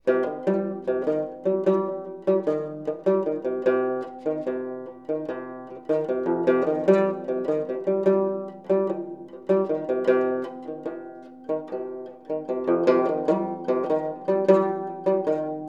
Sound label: fretless; Papa